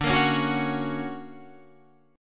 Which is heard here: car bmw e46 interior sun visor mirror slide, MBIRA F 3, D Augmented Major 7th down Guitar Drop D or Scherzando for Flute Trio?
D Augmented Major 7th down Guitar Drop D